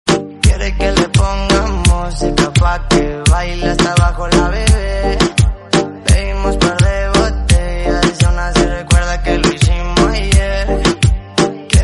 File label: remix, romanian remix